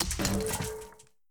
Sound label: ledge impact; adams venture origins; Kismet